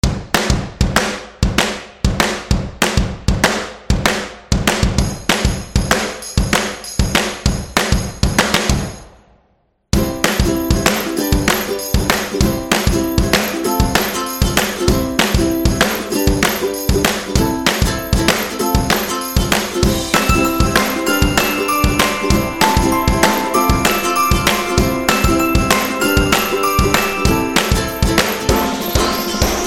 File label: Ukulele Claps